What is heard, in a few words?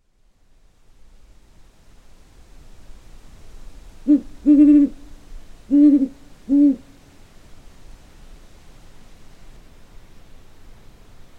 great horned owl and screech owl; bays mountain; nature